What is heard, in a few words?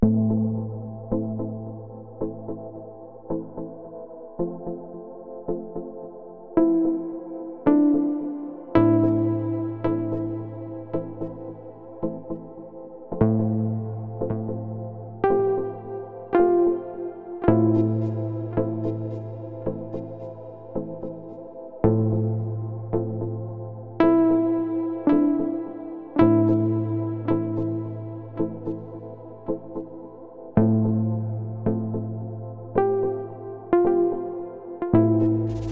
Ambience New Age